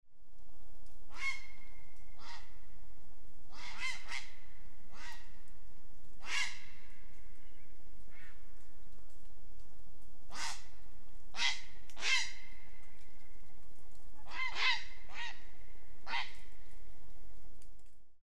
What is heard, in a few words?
Grey Heron Ardea cinerea